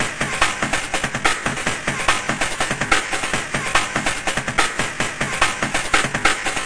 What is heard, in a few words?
144 bpm distorted to the bones; 141 to 160 bpm